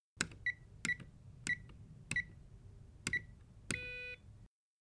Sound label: Alarm Keypad Entry; hi-tech-various